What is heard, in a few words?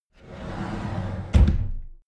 cupboard slide door close regular